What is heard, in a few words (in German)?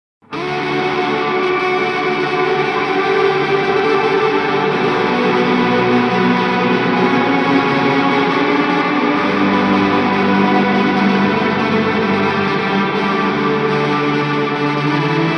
Shimmer mit Octave